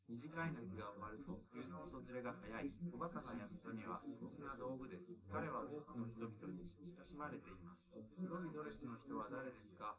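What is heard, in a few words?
male, live recording 250ms 1m; SiSEC11; underdetermined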